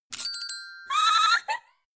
Sexy Robot Various Be, cartoon gadget fx

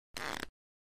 Cards Deck Flicks; casino games card games

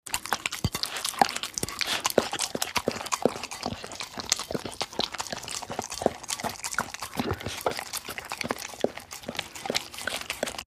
Dog Licking Plate; mvmt

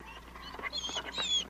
Dog Whimper Whine

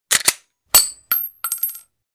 Pistol Cock Shell Eje

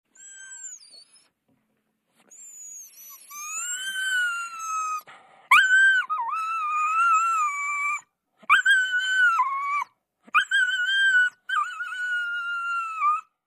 Dog Cries, High Pitched.

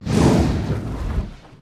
Dragon Fire Whooshes, No Crackle Element

